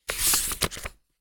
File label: page